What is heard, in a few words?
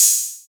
Open Hi-Hat; XLNT